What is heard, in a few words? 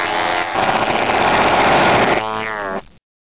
propeller stop